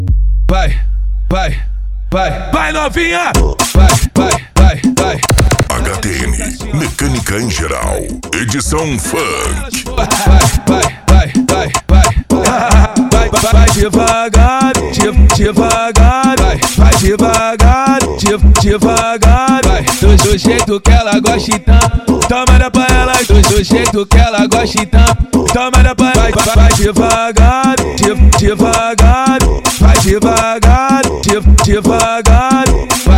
mecanica em geral edicao funk

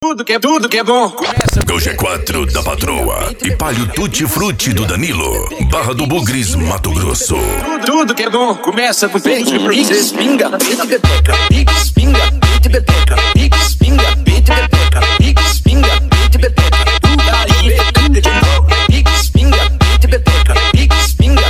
funk mega bass e flash back

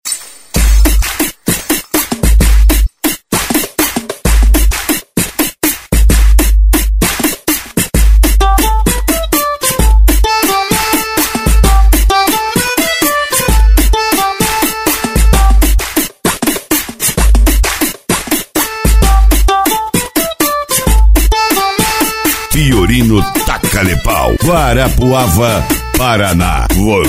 especial funk e electro house

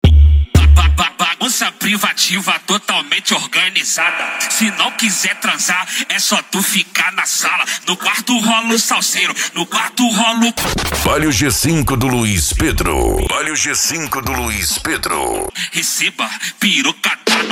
funk mega bass e forro; urupa ro

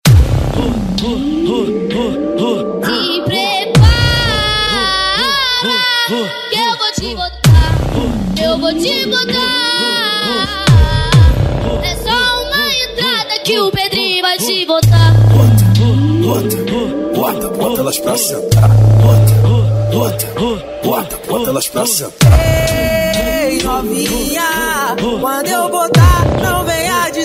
funk bass o poder do grave

